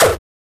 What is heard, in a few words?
Perc